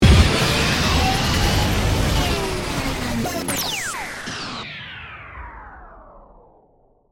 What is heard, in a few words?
Stinger Warble, Production, Element, Stinger, Warble, Digital; BlastWave